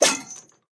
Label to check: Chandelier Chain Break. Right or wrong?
Right